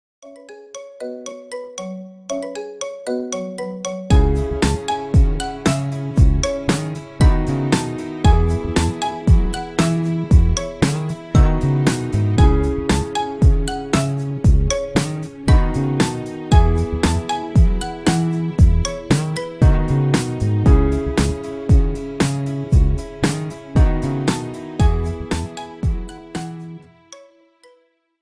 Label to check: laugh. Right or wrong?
Wrong